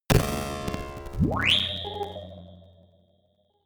bass bounce distorted, impacts

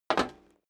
step boots single metal dry single stair up; Footsteps; schritte

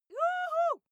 female cheer wohoo; schreie; menschen

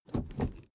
suv idle 1st gear change hard; verkehr transport; autos

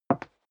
step leather shoes wood parquet single left; schritte

